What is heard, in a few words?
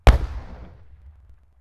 distant gun 60mm; WAR